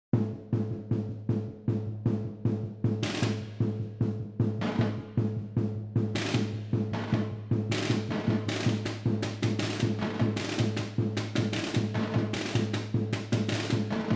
Precision in Percussion